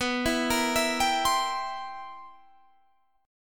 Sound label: C 7th B, C7/B chord; arpeggiated; JGuitar